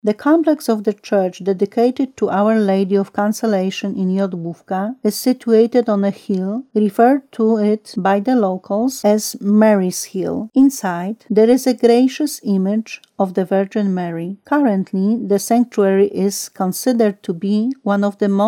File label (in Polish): jodlowka